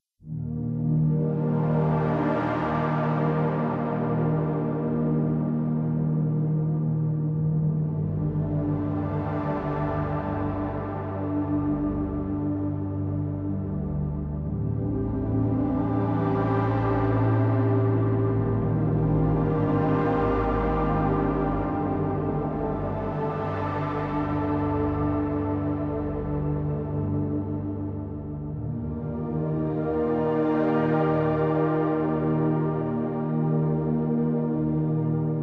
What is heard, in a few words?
City Ruins Stem; Epitasis